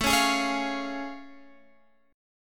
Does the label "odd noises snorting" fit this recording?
No